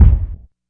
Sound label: classic hip hop kick; Classic Hip Hop Mega Kit